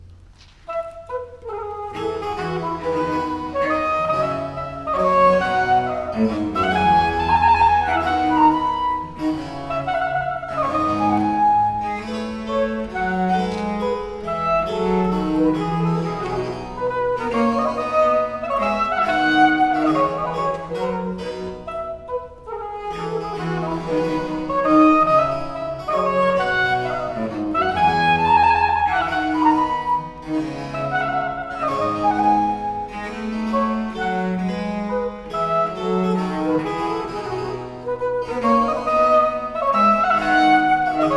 Air Gai; Suite from Les Fetes D'Hebe; Rameau

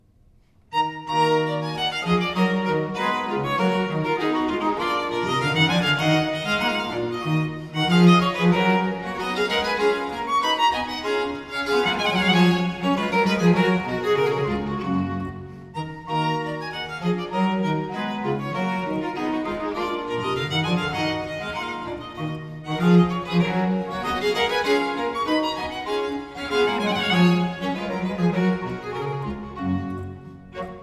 Sonata in e minor Allemande; Sonata No. 3; Haendel; Sonate a trio op. 5

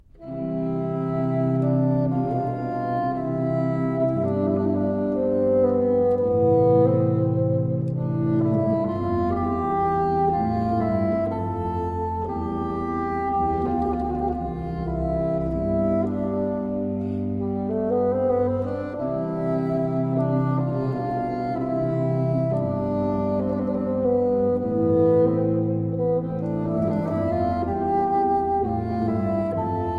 Sonata III in C major Sarabanda; Corrette; Le Phenix; Les delices de la solitude

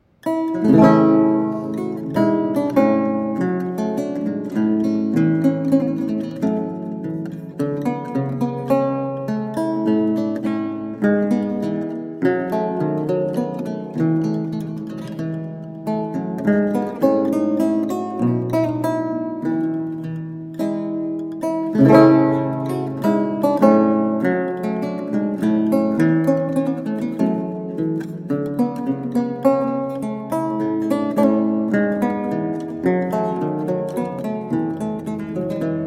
Courante in Bb Major; Les Larmes of Johannes Fresneau

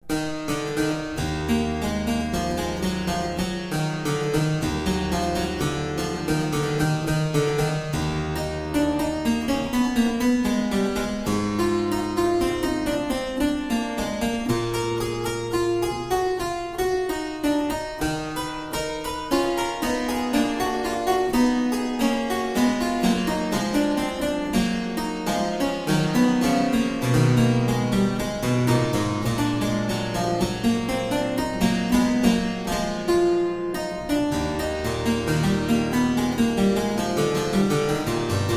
Toccata in E Minor Prelude, Keyboard; BWV 914; JS Bach

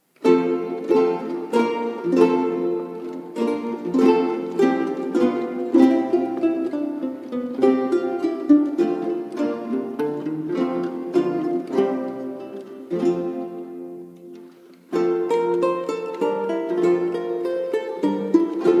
Pavana, Vihuela Quartet; Pavana 6 glosa; Luis de Milan

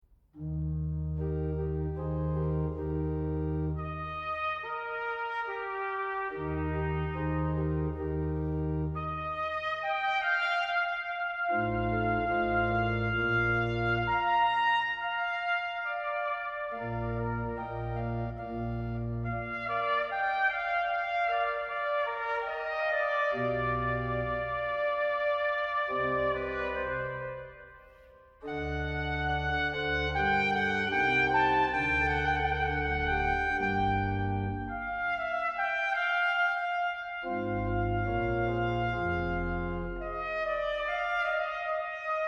Concerto in C minor - Andante, Trumpet And Organ; Georg Philip Telemann

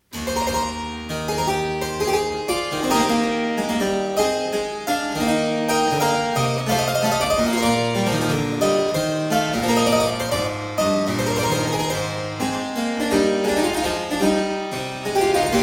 Gigue, Harpsichord Music; Louis Couperin

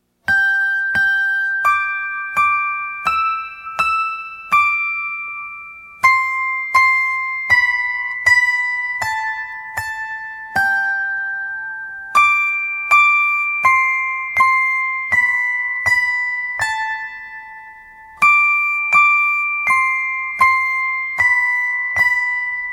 Twinkle Twinkle Little Star; Life is but a Dream

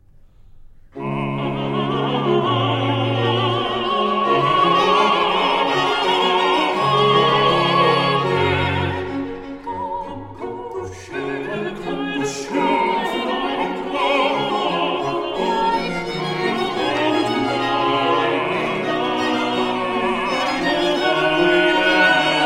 Coro Cantata; Nun komm der Heiden Heiland BWV 61; Johann Sebastian Bach

